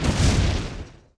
fireball ground; Alexander

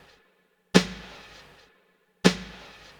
snare

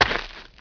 step gravel; SpecOps2 MPlayer Demo

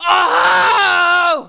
PAIN; Messiah

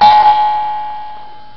Capture area; Prometheus 1.0

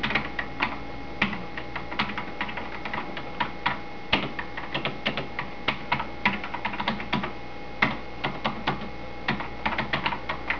Typing on Keyboard

